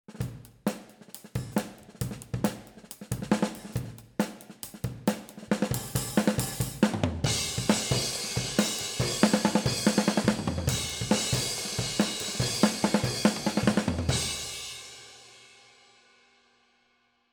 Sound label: snare mics oh